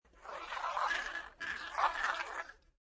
CPU Voice; Alien cyborg language pharse with slight flange effect - Variation 6; Computers; Michael Huang

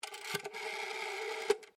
TELEPHONE OLD ROTARY BAKELITE DIAL

